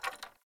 Bow Wood Rattle; Weapons; Player; Bows; Envoy Hunter Bow Ranged Melee

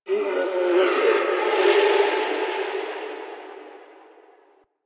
Landscape Distant Creatures, Ambience

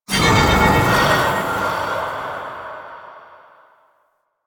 Sword Parry Stun; Warframe; Duviri; Dax; weapons

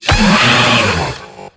Infested Lich Packet Pain

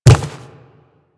Quadruped Medium Walk Dirt, Footsteps

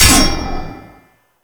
Parry Sword; Enemies; Weapons; Warframe; Teshin

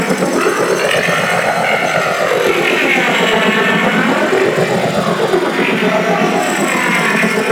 Space Blackhole Loop; Warframe; Railjack

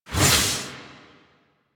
Archwing Scythe whoosh small; Melee; Warframe; Weapons; Exalted Archwing Scythe

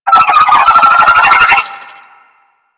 Space Drone Attack D; Warframe; Corpus Space Drone

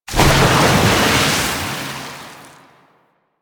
Tidal Wave Cast; Warframe; Warframes; Pirate

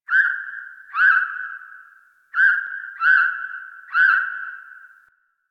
Landscape Plant Critter, Ambience; Warframe; Venus Open World; One Offs

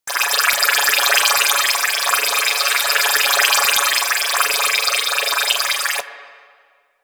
Distraction Drone Loop; DE; Warframe; Weapons; Archwing; Powers; Archwing Distraction Drones

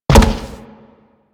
Step Metal, Footsteps; Enemies; Warframe; Grineer

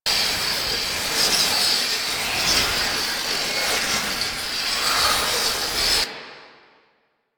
Impiety Loop; Warframe; Warframes; Dagath

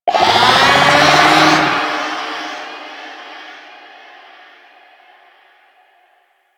Infested Golem Vocal Charge; Warframe

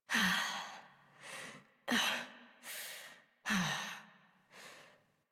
Breath Heavy Slow Operator Voice; New War

